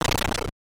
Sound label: Breaking a Block; super mario bros. 3 1988 nes